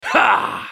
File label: effort; Vladimir; Character Voices - English; en US